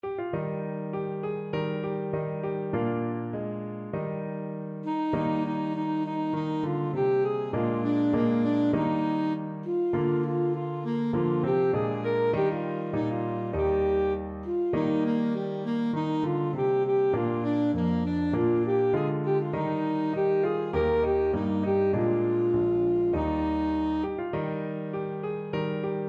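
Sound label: dicey reilly alto saxophone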